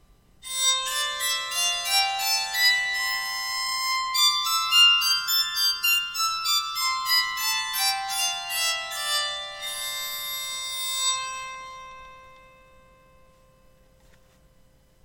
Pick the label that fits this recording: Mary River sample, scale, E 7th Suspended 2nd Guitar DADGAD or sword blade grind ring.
scale